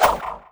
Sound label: knife swing; weapons; tf2classic